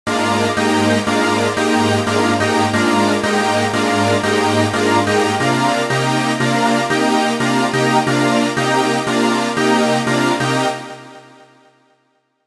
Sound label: synth Brass